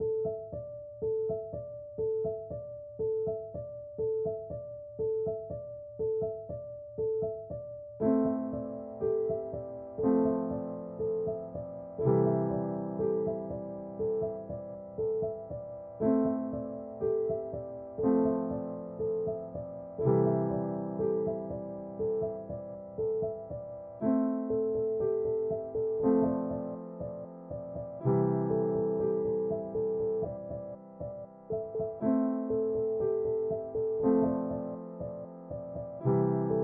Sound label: Piano Looped; golf peaks